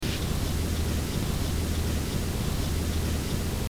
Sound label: Noise